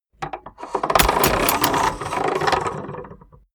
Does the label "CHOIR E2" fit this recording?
No